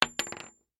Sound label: rifle wood, bullet shells; Bullet Shell Sounds